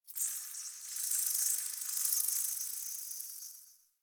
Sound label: Insect Day; Warframe; Ambience; Eidolon Plains; Eidolon Landscape; One Offs; Insects